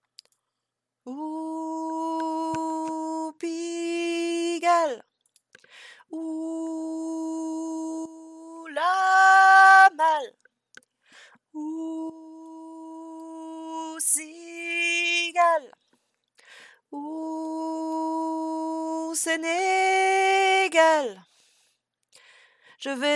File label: soprano; Piste soprano